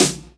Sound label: KIT, Dance Mega Drums, HIP; Best Service